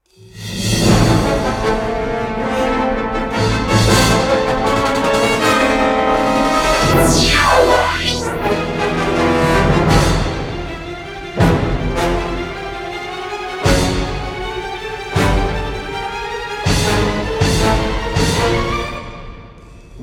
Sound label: Pursuit Break Into Start; Remember Me